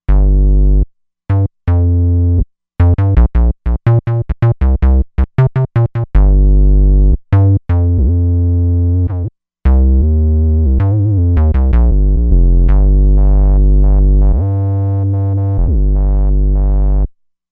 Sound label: Nu RnB Bass, Roland Fantom XR